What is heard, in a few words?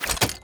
Shooter Melee Slash